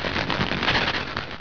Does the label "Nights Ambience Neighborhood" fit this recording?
No